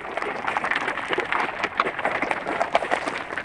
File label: Auto Tire Studded Loop; Automobiles